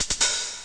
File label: drloop; Descent II